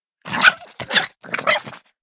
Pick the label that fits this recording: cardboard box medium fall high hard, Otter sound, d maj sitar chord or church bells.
Otter sound